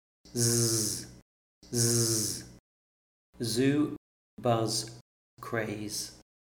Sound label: zoo buzz